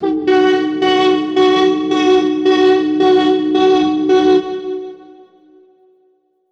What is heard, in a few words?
Verb Proc, alarm siren, 110bpm; musicradar